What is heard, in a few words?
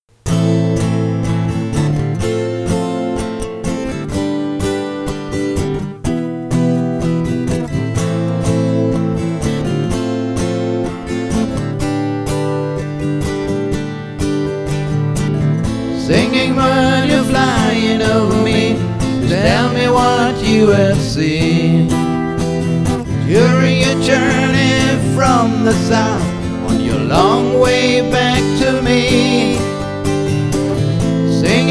Singing Bird Unplugged